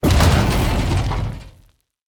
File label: Safe Hit